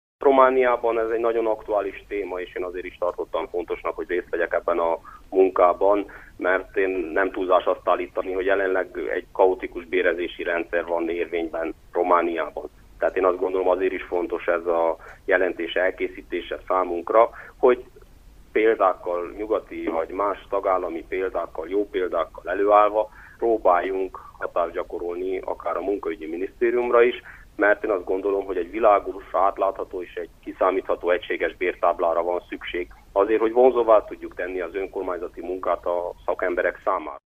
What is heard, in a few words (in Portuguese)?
forro